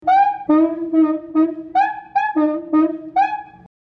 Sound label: cuica